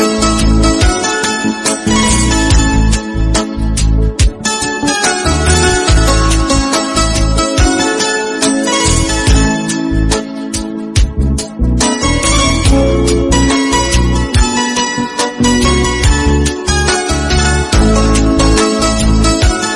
Instrumental Ringtone

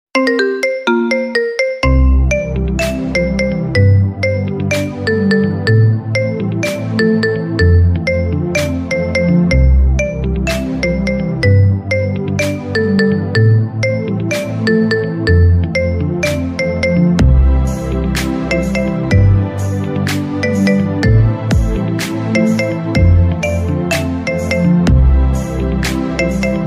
Famous Flute Ringtone, Instrumental Ringtone

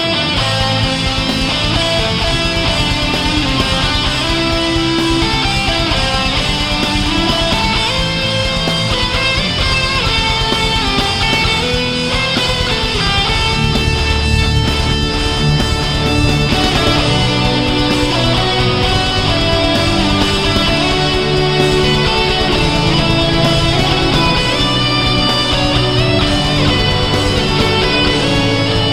Guitar

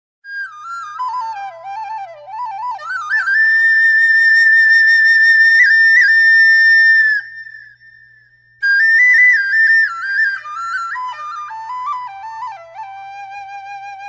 Beautiful Bollywood Flute

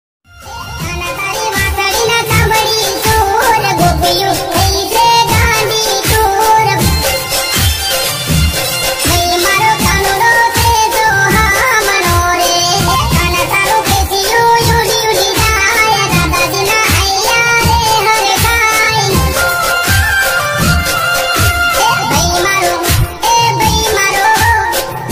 Ringtone

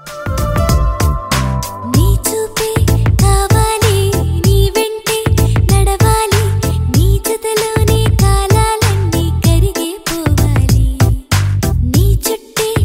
Telugu

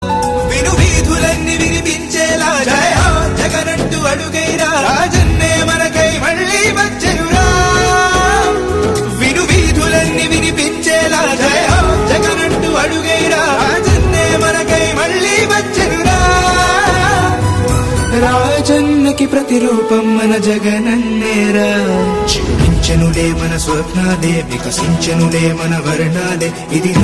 Telugu

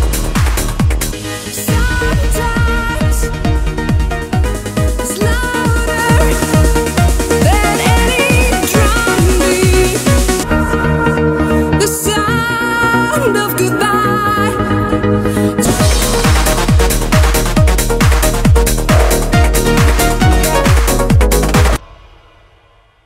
edm

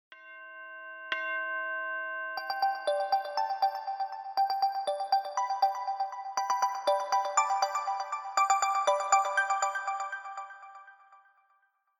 samsung s10 calculagraph

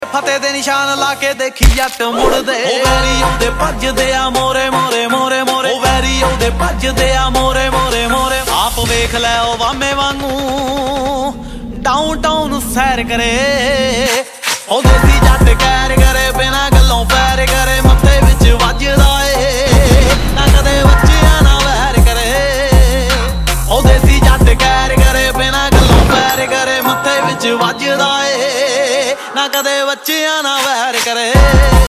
ringtone punjabi songs ringtone

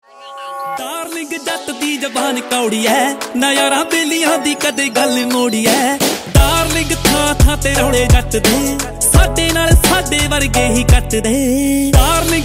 Ringtone, Punjabi Music

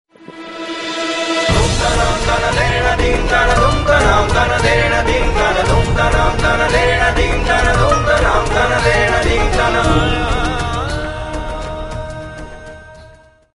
Serial Ringtone

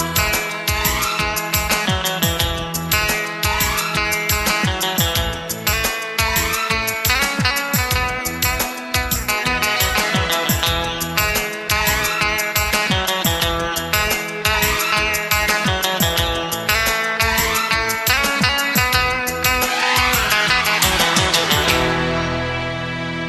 Guitar Ringtone

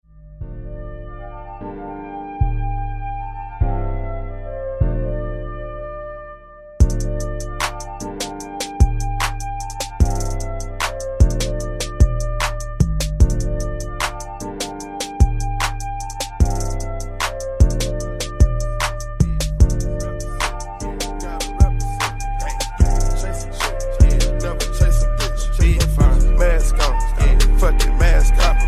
instrumental

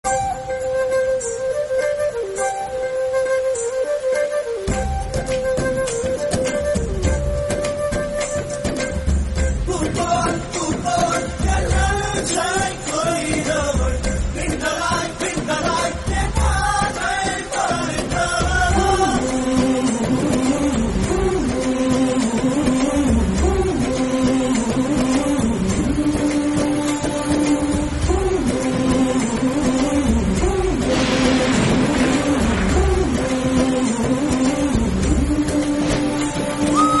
Hindi

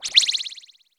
yumyum sms

